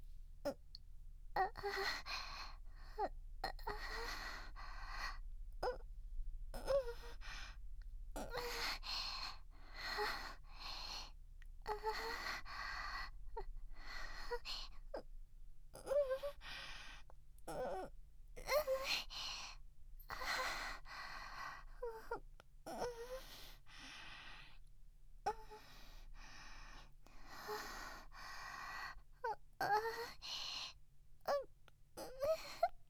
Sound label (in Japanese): 少しずつ感じている喘ぎ